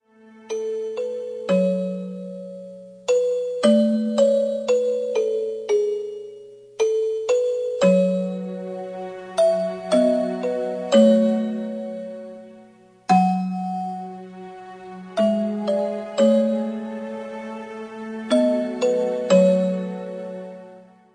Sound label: Music Box